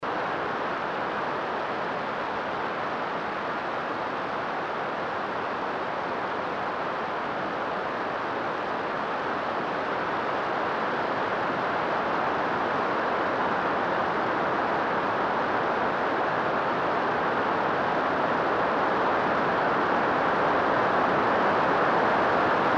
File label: 22.21MHZ Type II; Ashcraft